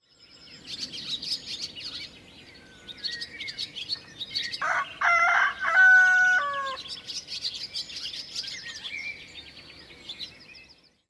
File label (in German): Leben auf dem Land, Bauernhof; erlebnis bauernhof